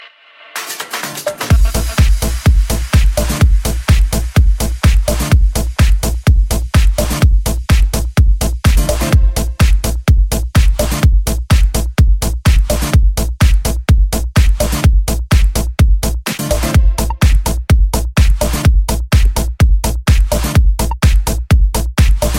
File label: Rhythm